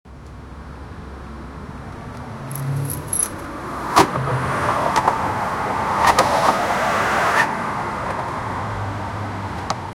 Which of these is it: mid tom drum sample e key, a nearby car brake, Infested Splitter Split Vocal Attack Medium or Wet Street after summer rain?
a nearby car brake